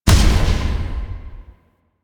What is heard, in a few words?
Lotus Hand Projectile Die; Warframe; Weapons; Duviri